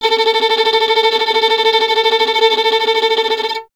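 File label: string violin, Vln Trem; Miroslav Vitous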